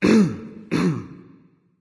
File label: clearthroat; erlangshen